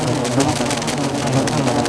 locust swarm loop; warcraft3

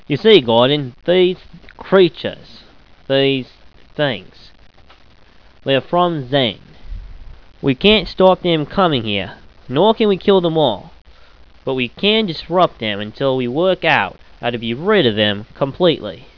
ahh, scientist

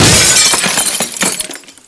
bust glass; debris

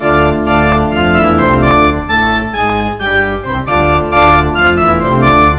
organ

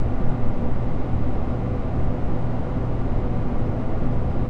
industrial loop

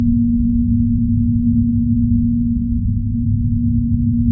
cubicle equipment

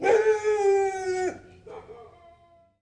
dog

